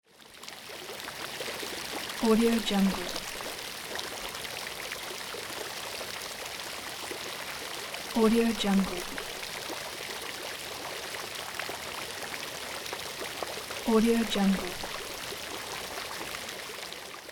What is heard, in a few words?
water flow